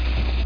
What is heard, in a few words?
Pencil